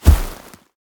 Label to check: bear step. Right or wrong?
Right